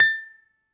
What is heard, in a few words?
BALALAIKA, Prima Balalaika, Plucked Strings